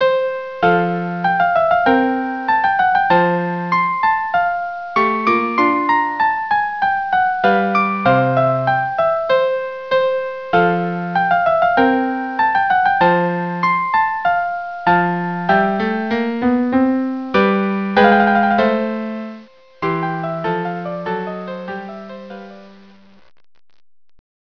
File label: andante in F musical clock; haydn